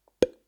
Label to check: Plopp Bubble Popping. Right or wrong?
Right